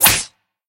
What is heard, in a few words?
hit